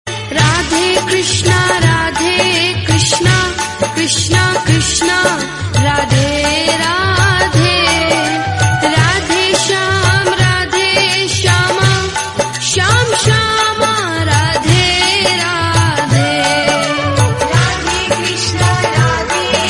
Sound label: Bhakti Ringtone